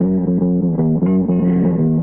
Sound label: bass figgy bonyah, Loops, Phonky